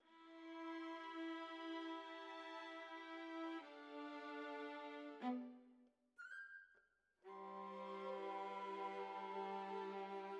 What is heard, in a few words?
Violas, jazz for piano